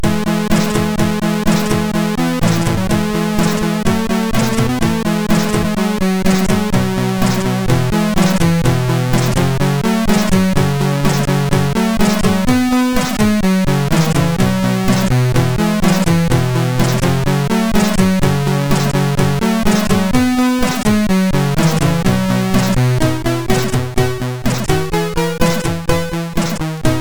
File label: X-Mas Dub, MOS6581R4